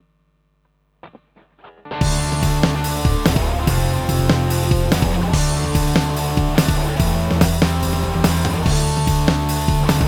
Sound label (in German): Metal mit versch. Intensitäten